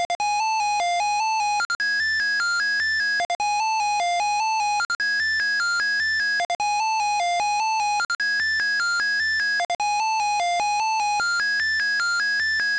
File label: MONO spook; Nokia; Monophonic